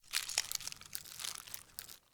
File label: Foley Heal Pickup; Enemy; Characters; Corrupted Sproutfolk